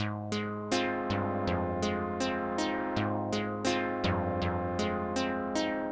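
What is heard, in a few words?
synth, Ab, Hip Hop & RnB; ProSamples vol.34 - Hip Hop & RnB 3; How I Feel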